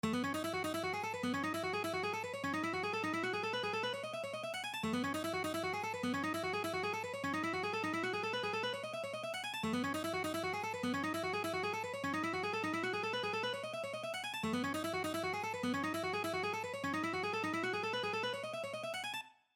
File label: Alternate Picking Exercise, guitar